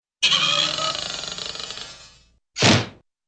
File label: spring stretch and thump